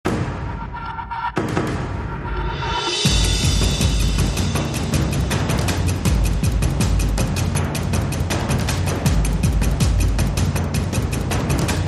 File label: Drums Percussion